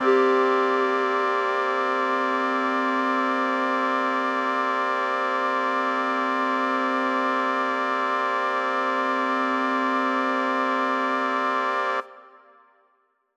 Mixed Winds C7:9, synth orchestra

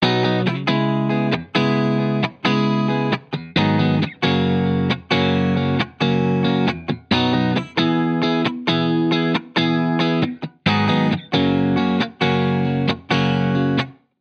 Guitar Bpm135 Key B, 70s Guitars; Fox Samples - Back to the 70s Guitars

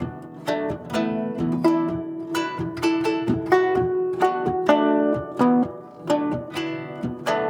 Guzheng Poly-Rhythm; Asia; Continental Drift Aftershocks

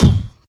voice bd, Yamaha TG-500

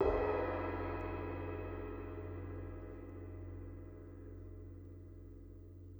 Cymbal, Orchestral Percussion One Shots; Sonic Foundry (Sony Creative Software); Orchestral VOL-2

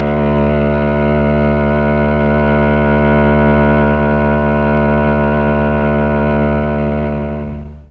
D2 TRON CELLO; Mike Pinder - Mellotron Archive CD

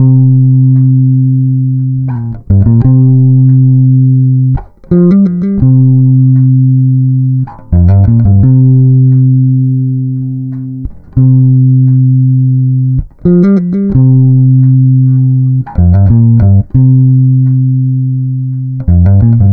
Bass, Electro Lounge; Sonic Foundry (Sony Creative Software); 086 Huggybear

